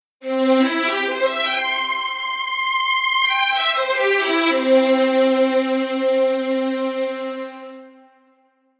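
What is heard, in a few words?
Strings VSL Legato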